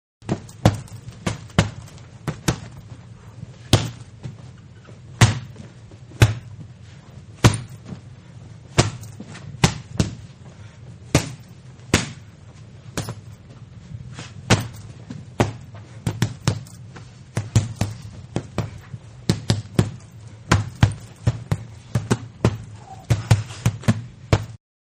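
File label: Boxing Heavy bag using both hands; Sports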